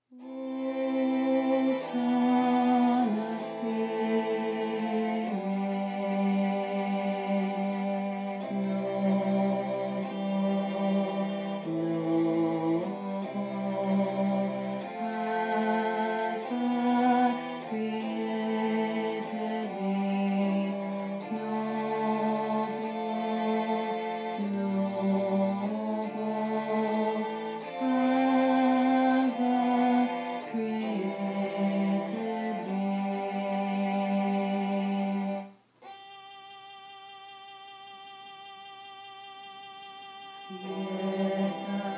tenor

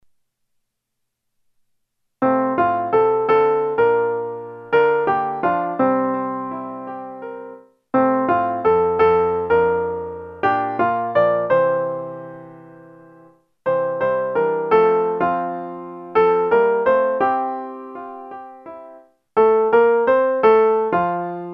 soprano instrumental

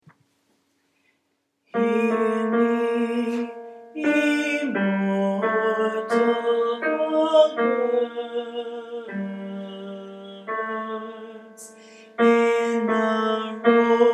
tenor 1

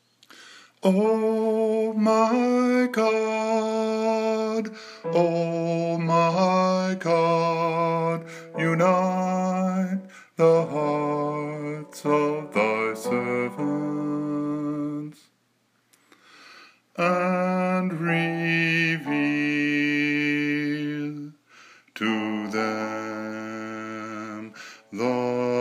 bass

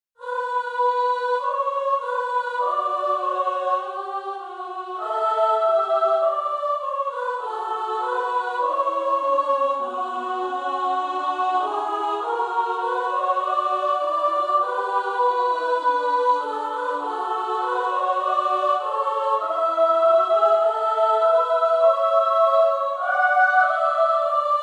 low

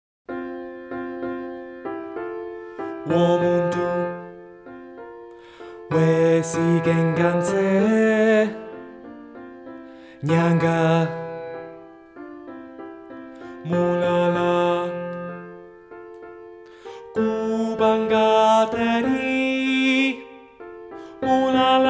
tenor; bahai community

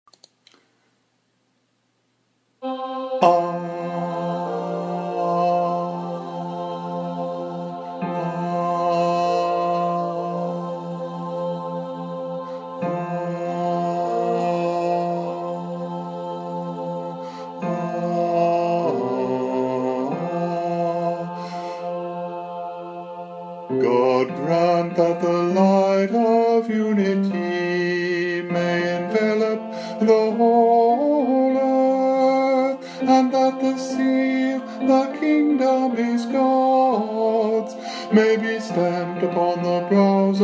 bass

